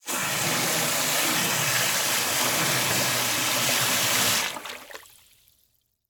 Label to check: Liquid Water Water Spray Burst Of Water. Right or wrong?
Right